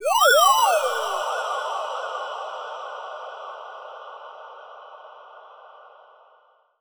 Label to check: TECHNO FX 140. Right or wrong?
Right